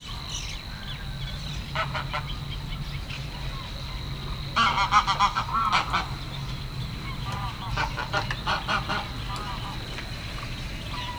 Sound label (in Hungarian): nyári lúd halastavak; hortobágyi nemzeti park 2013